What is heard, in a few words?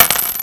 coin